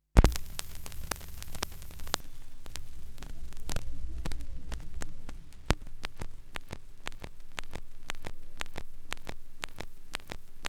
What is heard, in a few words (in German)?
schallplattengeraeusche, Vinyl